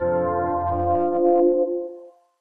Synth Bell Sweep

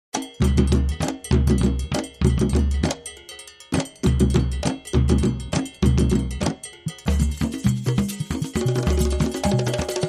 Master Of The Drums

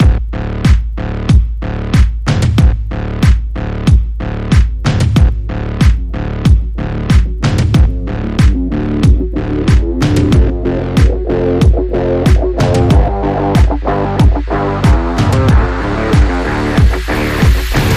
No Lead

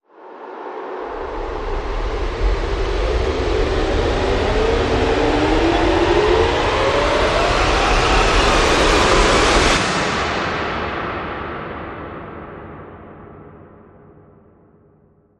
rise short

